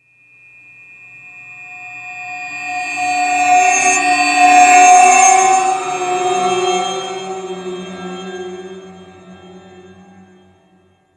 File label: Creepy One Off, Ambience Interior; Warframe; Orokin Derelict